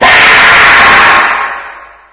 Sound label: go alert, stalker; Source Engine; video game; npc